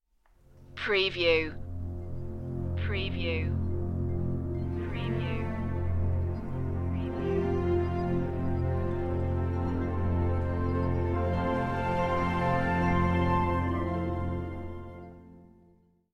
no choir

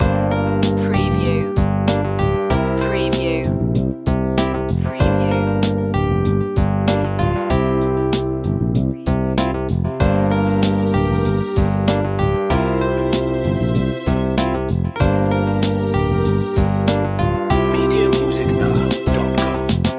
drifting loop

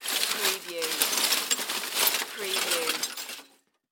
Junk Pile Movement; Sound Effects Bible

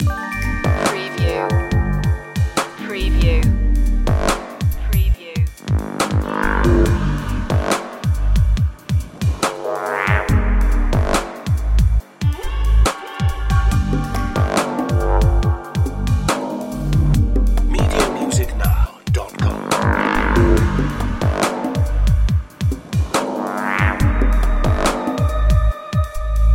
stigmata loop

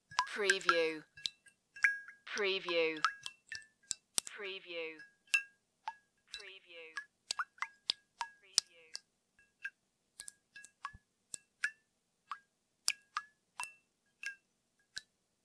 Water Drops

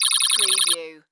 Data Text Typing Beep

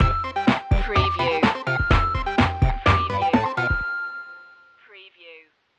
8-bit instincts stinger

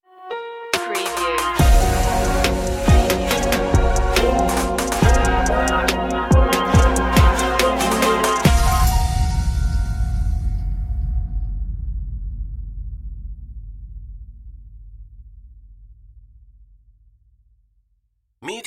smooth soul vibes stinger no vocals

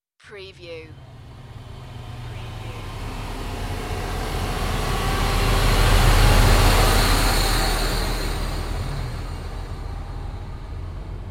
Slow truck drive by; beanstalk audio

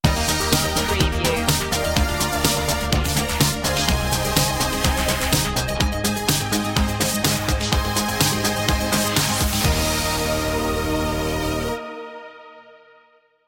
lets go 80s stinger

